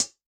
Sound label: Hvy Cls, HiHat; Yamaha DTXpress-II